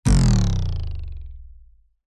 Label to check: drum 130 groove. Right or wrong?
Wrong